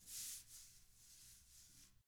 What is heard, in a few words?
TAMA, Drums; ILIO; Double Platinum Drums 1